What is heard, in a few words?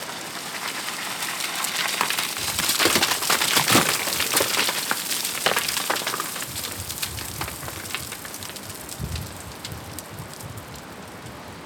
Rockfall, On The Glacier